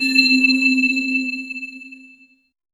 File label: gothic alchemy critical, bell tone